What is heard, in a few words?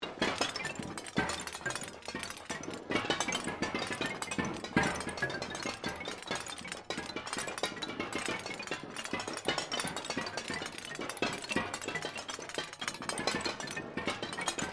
Created Ambience Trash Collection Loop, Artificial Sound Effects; Various Ambience created through sound manipulation in FMOD